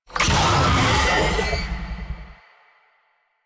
Infested Oni Vocal Pain; Warframe

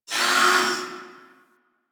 Gas Robot Repair Arm; Ambience; Props; Warframe; Corpus Gas City

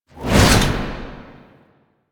Alchemy Grenade Throw; Warframe; Entrati

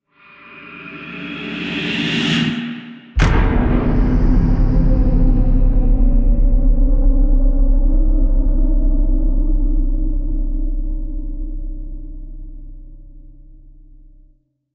Boom; Warframe; Clan Dojo; Dojo Misc; Dagath

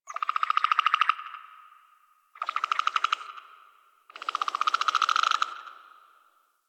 Night Tree Critters; Warframe; Ambience; Eidolon Plains; One Offs

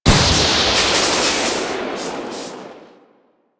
Lightning Machine Strike; Ambience; Props

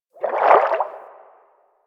Fish Tank Movement Quick; Warframe; Liset; Hub; Personal Quarters

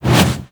Generic Weapon Aim Transition In; Warframe; Weapons; Archwing

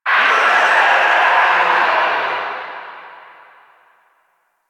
Exterior Foreground Infested; Warframe; Ambience; Nineteen Ninety Nine

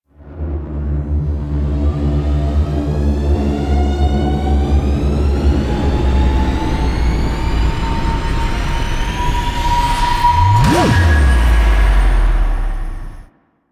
Harmonic Sweep; GlassQuest; Mission Three

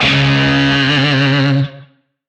Shawzin C Sharp; Oct One; Music; DE; Warframe; Lizzie Shawzin

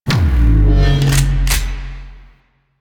Shotgun Pickup Stinger; Venus; Warframe; Veilbreaker; Quests; Kahl

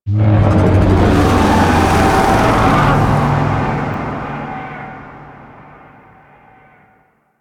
Void Dragon Vocal Distant Idle; Duviri; Warframe; Citrines Last Wish

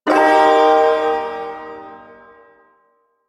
Ersatz Shawzin Min Chord; Warframe; Duviri Ersatz Shawzin

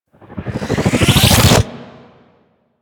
Spawn Drop; Warframe; Corpus; Arachnoid Wraith

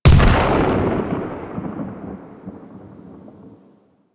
Ship Primary Cannon Proj Explosion B; Weapons; Warframe; Railjack

